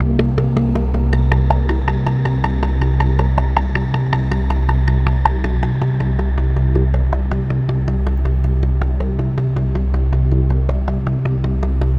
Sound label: AMBNT 160; eJay; Ambient